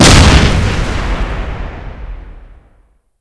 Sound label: wasp explosion; tf2classic